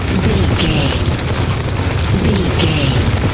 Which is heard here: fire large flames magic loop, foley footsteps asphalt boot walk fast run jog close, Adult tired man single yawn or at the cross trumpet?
fire large flames magic loop